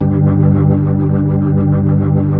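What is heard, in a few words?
B-3, Hammond B-3 organ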